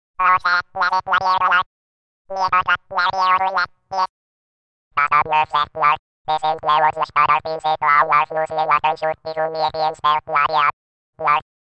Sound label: Synth Martian Voice, alien